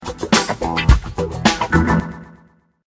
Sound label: funky alert, notifications; Motorola QRTY